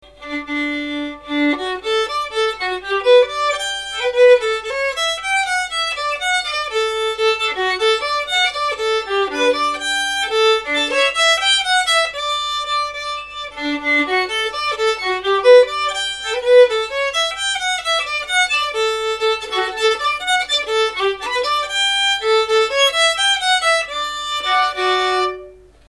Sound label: waltz; violin